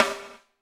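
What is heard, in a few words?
snare drum, Drums; Bob Clearmountain Drums I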